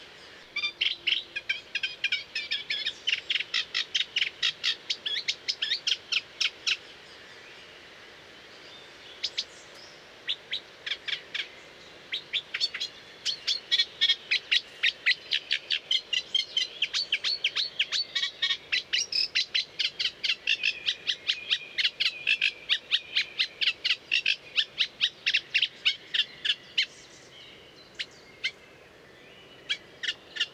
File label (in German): Teichrohrsaenger; Moos DEG; Michle Peron